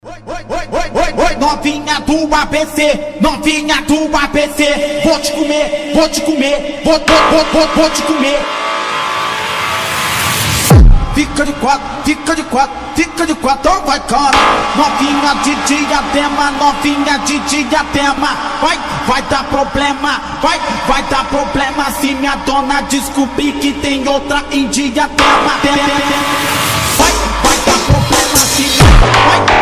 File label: beat phonk automotivo